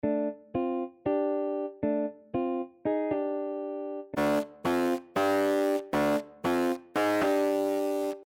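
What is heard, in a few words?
Distortion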